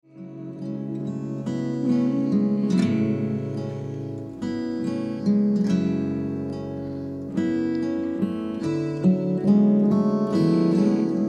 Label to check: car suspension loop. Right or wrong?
Wrong